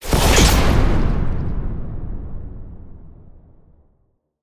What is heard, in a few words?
Distant Sci-Fi Explosion